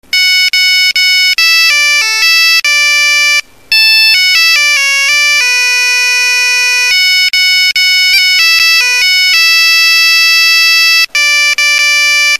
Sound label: siemens, Polyfonisk musik, rock; midi